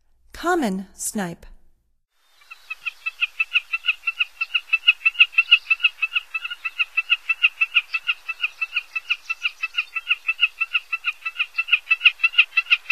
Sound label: Common Snipe; North America; Stokes